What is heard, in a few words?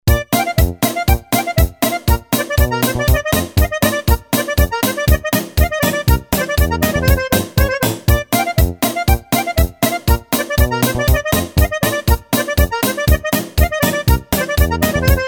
Canvas Steel kontra